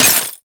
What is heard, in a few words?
Melee Impact Glass; Warframe; Weapons